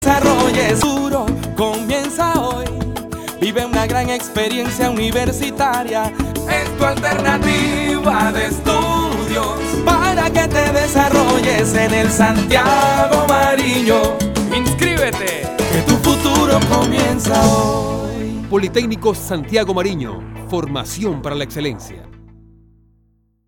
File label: jingle; SANTIAGO MARIÑO; CORTO